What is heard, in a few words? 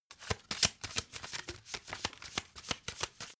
playing cards shuffle regular